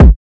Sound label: Kick drum; Khezie Hip Hop Kit